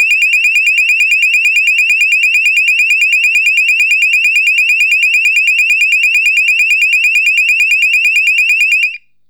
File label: Sounder; Very High/Very High Fast Two Tone Alarm - 2400Hz to 2900Hz; Weather Proof Sonos Electronic Sounder SS240W